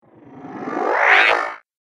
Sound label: Electroid Whoosh Medium